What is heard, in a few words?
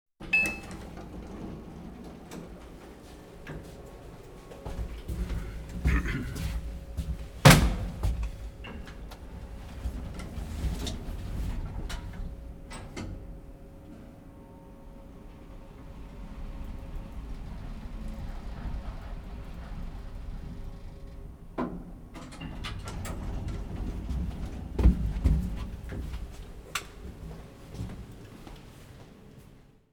Lift